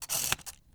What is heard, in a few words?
button small servo; soundmorph